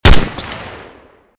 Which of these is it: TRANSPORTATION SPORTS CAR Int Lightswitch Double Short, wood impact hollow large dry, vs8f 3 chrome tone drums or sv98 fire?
sv98 fire